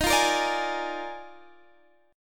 D sharp Major 7th Suspended 2nd Suspended 4th, Guitar, Standard; strummed; x,18,15,15,15,16; capo=2